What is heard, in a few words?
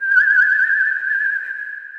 Whistling Theremin; Effects; Ableton; Glitch and Wash